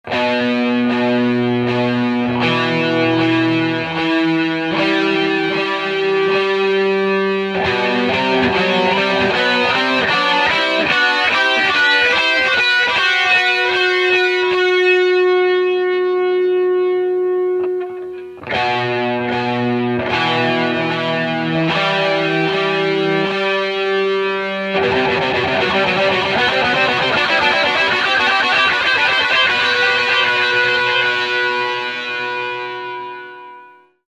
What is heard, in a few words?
Cascading Delay, DigiTech Red Special Pedal, electric guitar